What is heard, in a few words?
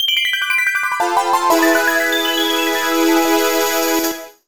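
Jingle Item get; minna no oekakiya san 2009 nds gamerip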